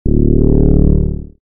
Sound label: shield